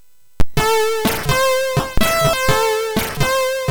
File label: Jingles, MOS6581R3; hvsc